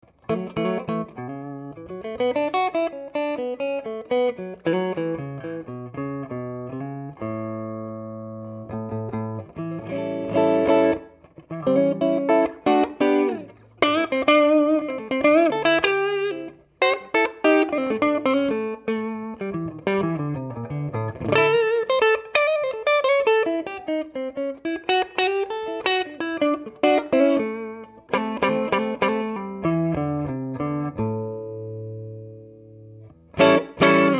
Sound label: wide range neck clean; lollar